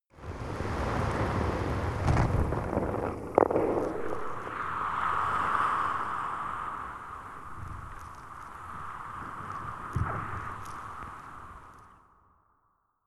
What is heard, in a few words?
water fx random